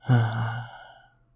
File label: Sleepy snore